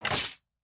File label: Trap; Survival Game Sounds - Lite Edition; Combat and Hunting